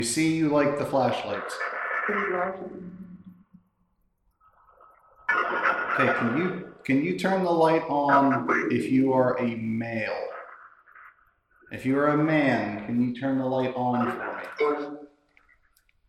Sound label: Servants Quarters Phasma Box Flashlight